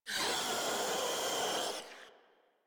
Heavy Bark; Enemies; Vocals; Warframe; Proto Infested Ancient

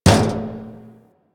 Physics Barrel Impact Medium, Metal Barrel

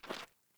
Grunt Boot Walk Gravel, Footsteps; Characters; Enemy; Melee Grunt; Boots; Sinecure

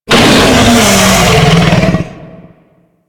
Infested Bone Blade Vocal Death B; Warframe

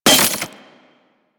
Melee Impact Glass; Warframe; Weapons

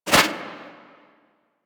Tengu turbulence deflect; Warframe

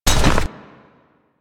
Generic Vault; Warframe

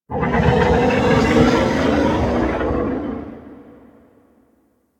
Golden Maw move; Warframe; Ambience; The War Within

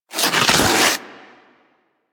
Armadillo Roll Snow

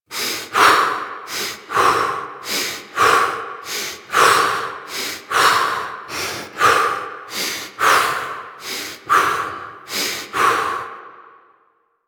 Breath Running Loop; New War; Teshin; Breathing

